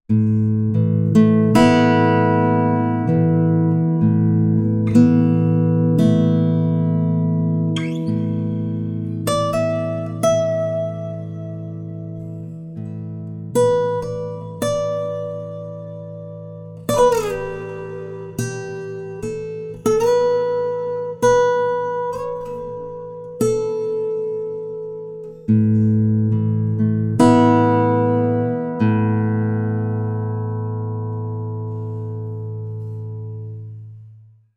guitar, timestretch

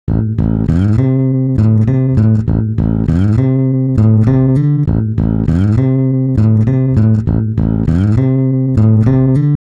bass, pitchshift; ik sampletank

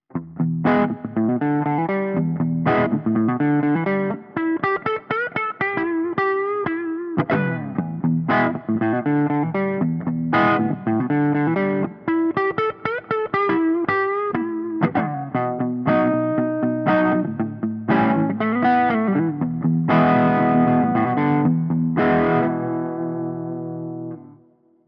crunch neck; custom77 cs4

